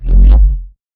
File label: Movement Jog; Titanfall 2